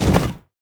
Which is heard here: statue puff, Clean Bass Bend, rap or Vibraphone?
statue puff